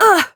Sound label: Pain, Adult Operator; New War